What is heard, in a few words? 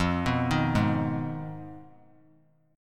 F Minor 7th Flat 5th; arpeggiated; JGuitar